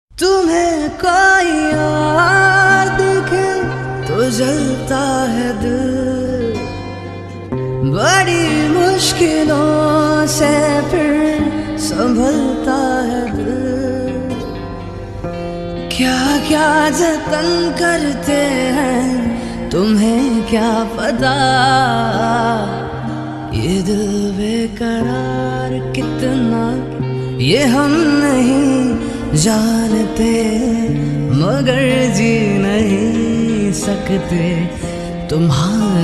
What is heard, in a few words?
Old Hindi Song Ringtone